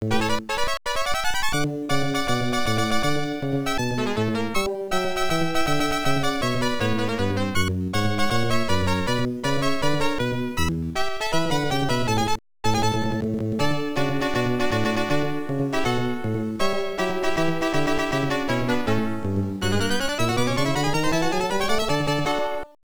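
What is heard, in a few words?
Game Set (Draw), baseball; Pro Yakyuu Family Stadium Pennant Race; MSX2; MSX-Music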